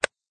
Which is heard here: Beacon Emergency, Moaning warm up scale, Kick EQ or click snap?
click snap